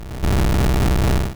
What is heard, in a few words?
Supersonic; Pokemon; Gen 1; attack moves; RBY